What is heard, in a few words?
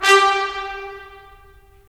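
brass trumpets staccato; Roland - LA Composer vol. 4; 90 s Sample CDs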